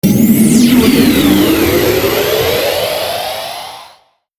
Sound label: spaceship engine startup